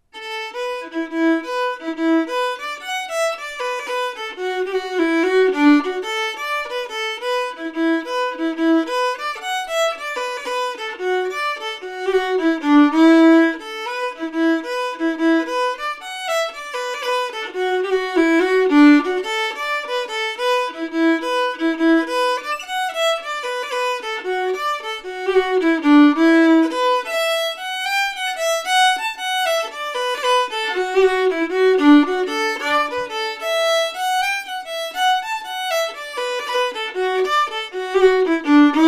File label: Jig (fiddle); Killavil